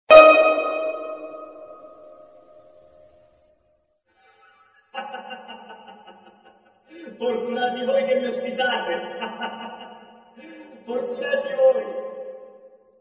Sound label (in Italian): Percussioni e voce fuori campo